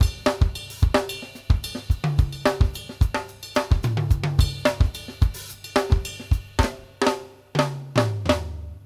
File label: + CYM, LATIN 108; Sampleheads; New York City Drumworks VOL-1